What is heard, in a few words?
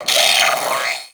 Sentient Brachiolyst Pain; Warframe